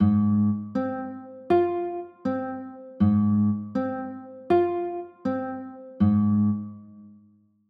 a flat 6

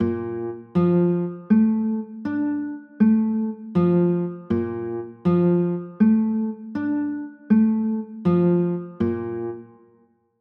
b flat maj7

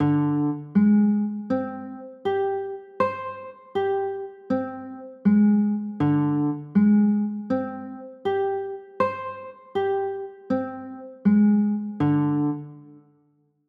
b flat 9, chords

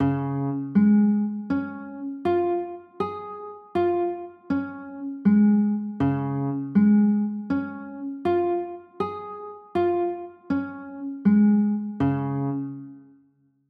d flat maj, chords

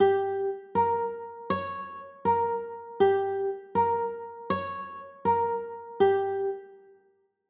g dim, chords

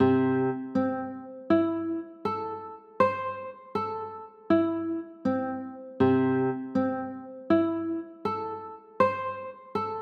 c 6, chords

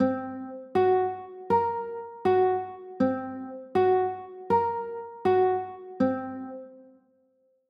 c sharp 6 9, chords

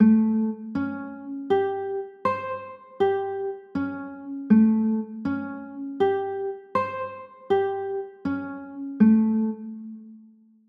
a sharp 6 9, chords

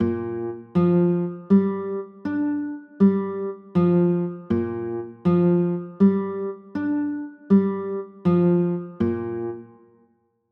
b flat 9, chords

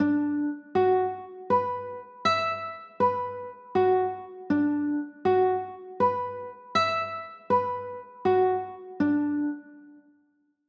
d 6 9

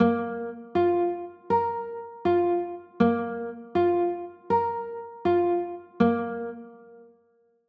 b flat 9, chords

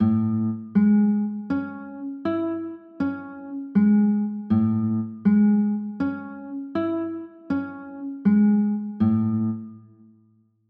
a maj7, chords

